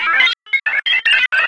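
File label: sphere blips large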